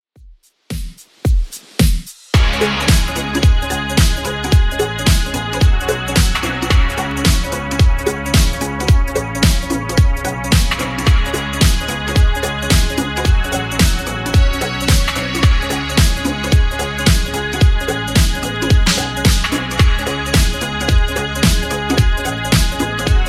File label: 80s Redrum 110bpm